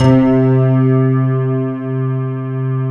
PNO HEAVN; EDENPNO

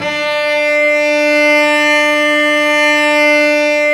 STR Vc Arco p

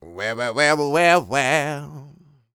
Vocal Planet R&B & Dance; ILIO

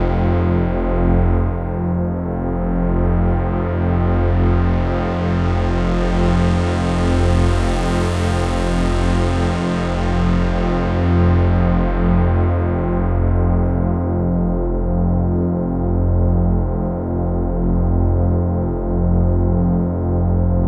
Heavy Synth Drone F

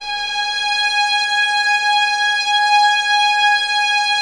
violins G#5, string ensembles; Miroslav Vitous; 11 Violins

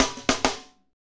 Hip Hop, Drumscapes Roland; Northstar

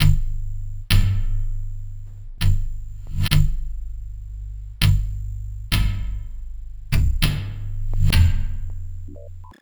Structured Rhythm, IDM Rhythms

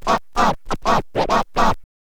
scratch short, Vinyl scratches

